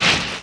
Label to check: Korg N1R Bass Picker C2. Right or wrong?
Wrong